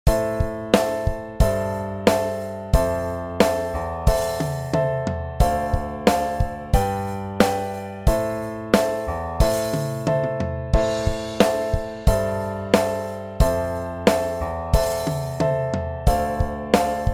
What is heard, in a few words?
rock piano slash chords